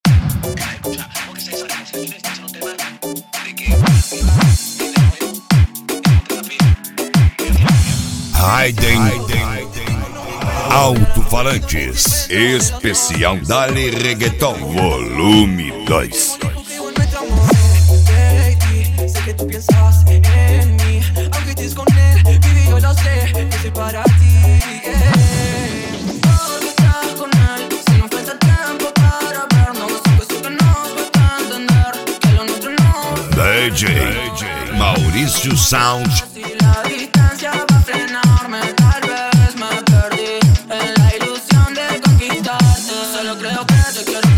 reggaeton; cd raiden alto falantes

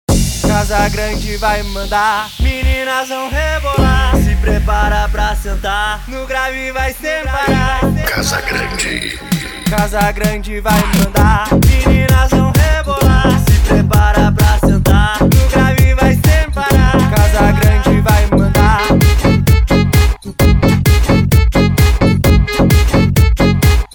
mega funk

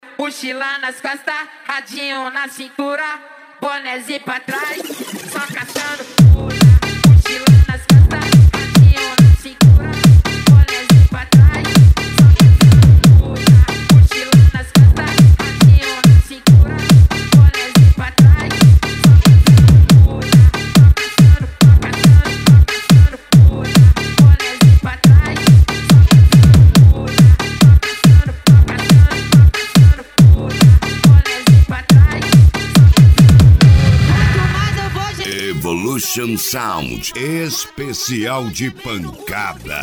esp de pancada

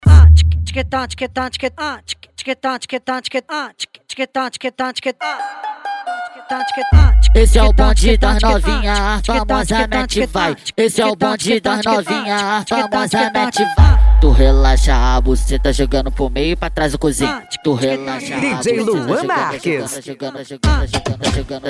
funk grave

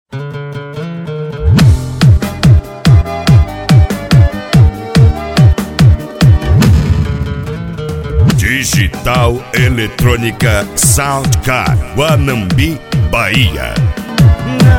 pancanejo eletronica sound car; cd pancanejo digital

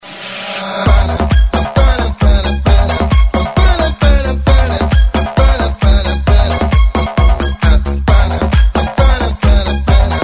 eletro funk; cd balada eletro funk vol4

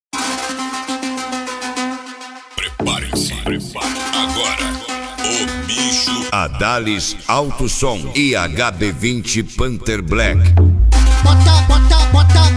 esp de cavalgada

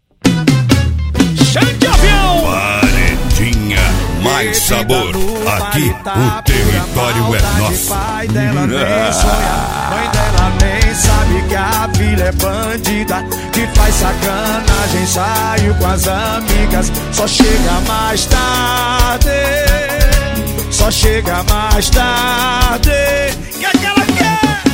trumpete

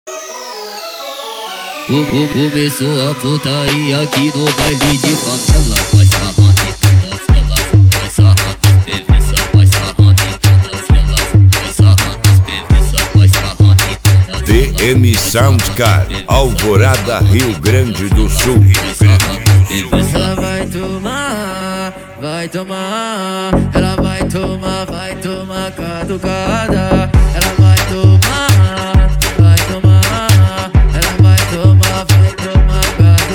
funk agressivo

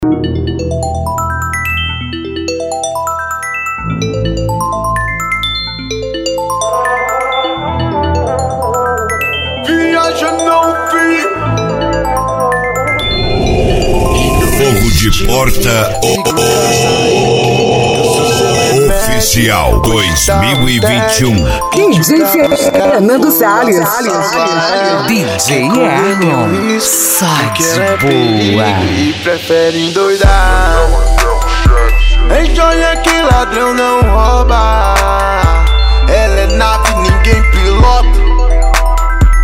rap

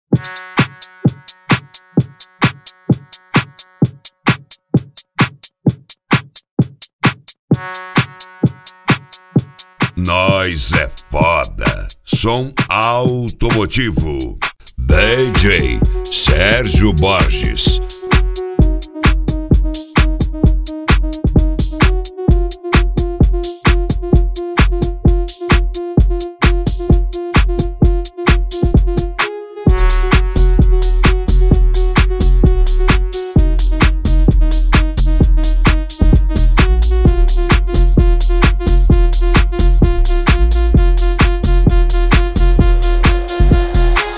eletrofunk; som automotivo

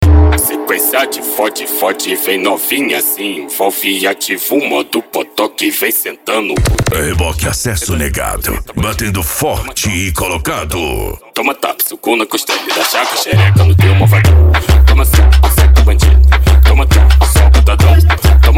racha eletronica e funk mega bass

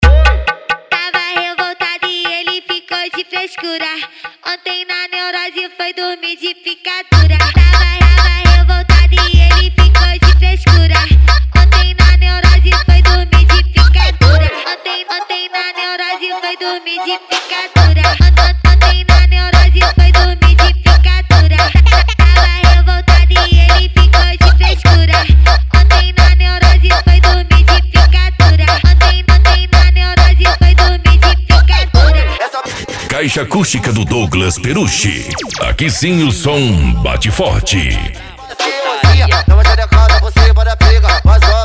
especial funk mega bass

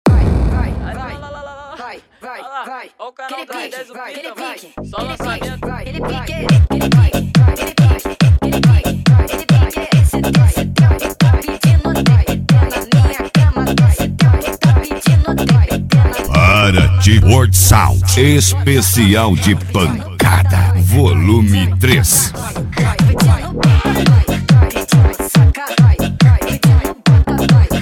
esp pancada

